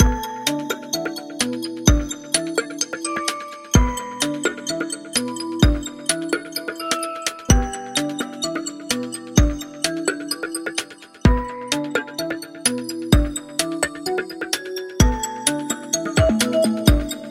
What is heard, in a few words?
Exciting Quiz